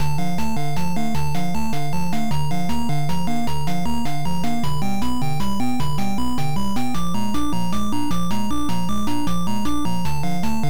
Classroom, Nurse, Auto Shop; Ghoul School; NES

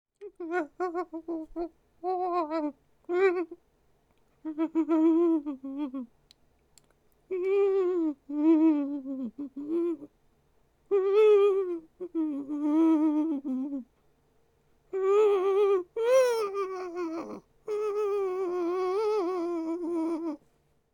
Male Idle; a dump in the dark

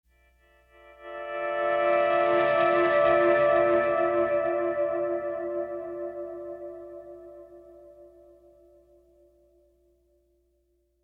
Pleasant Guitar; ML Pleasant Guitar Mood 5

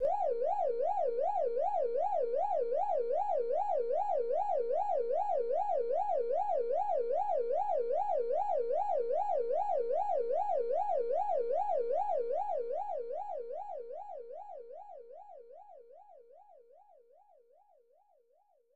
In-Game Ambience; pac man 2 the new adventures 1994 snes

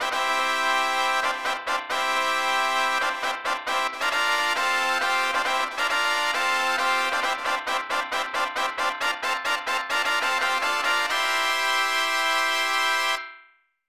Winning Fanfare; Ganso Pachi-Slot Nihonichi; SNES

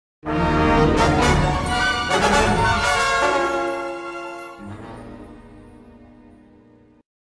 Stinger Spawn; Family Guy Back to the Multiverse

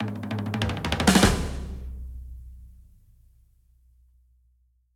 Drum Fill Transition

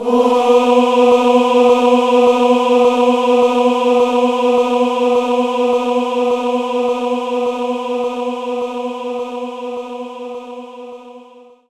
Concert Choir B3

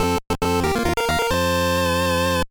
Unknown Jingle; Kamaitachi no Yoru Advance; GBA

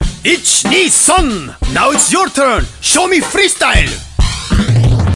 Entering Cool Mode

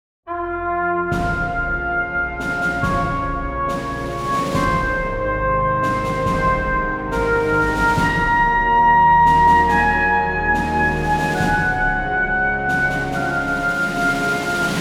Battle Start Trumpet

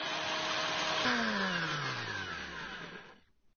Blender ball Blend; papas cupcakeria online windows gamerip 2013

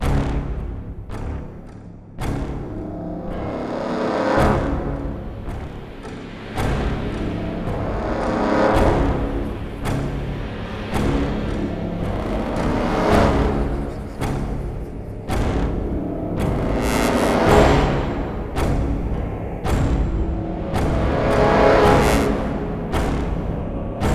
Mine Nodule Beat; Crysis

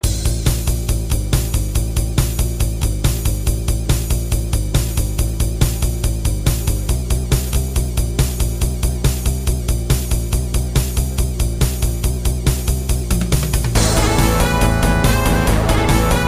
Endboss End Loop

